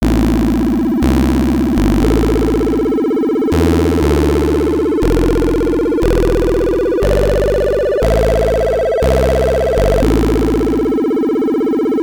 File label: booooooo; chiptune